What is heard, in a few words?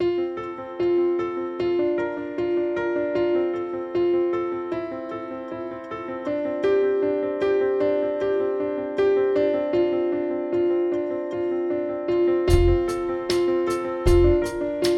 Piano; piano magic